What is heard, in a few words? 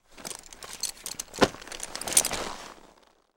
saddle, horses; CosmicRage